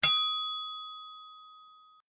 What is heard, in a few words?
Charge; Charge-9Audio